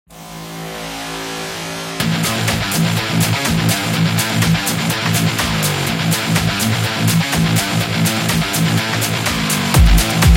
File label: Dubstep Metal